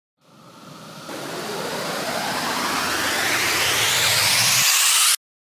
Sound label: RISER